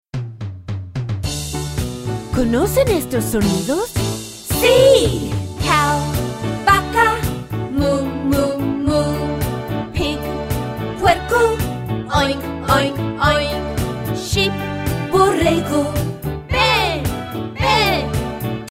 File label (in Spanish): ¿Conoces estos sonidos?; Spanish Song; This song is sung in Spanish